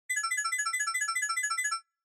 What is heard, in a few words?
Censor Bleep Synth, Mid